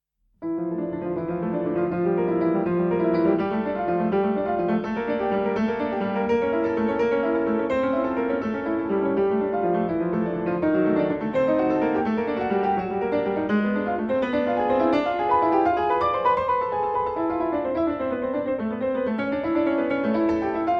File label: Prelude Toccata in d